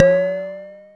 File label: Perc, Nord Modular Drum Samples; Yawgmoth